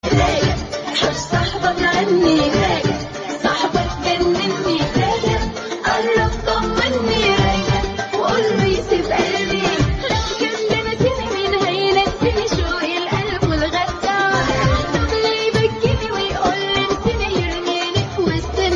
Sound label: arabic ringtone